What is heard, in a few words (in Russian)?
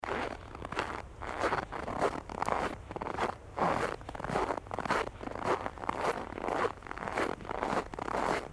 zvuk snega